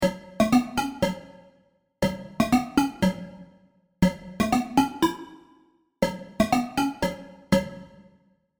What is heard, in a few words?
vital presets; chiqui audio; vital plucks presets